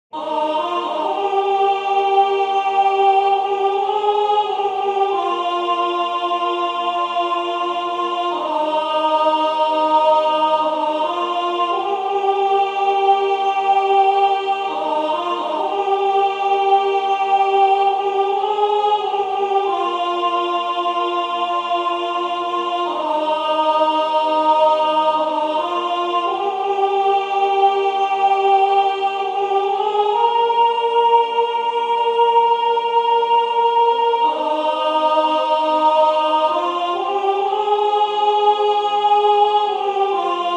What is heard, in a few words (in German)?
SATB Sopran; Einzelstimmen